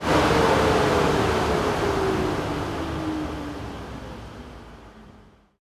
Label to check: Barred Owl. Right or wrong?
Wrong